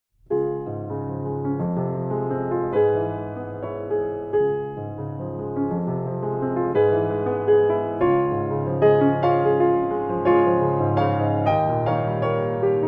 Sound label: Grand piano; PETROF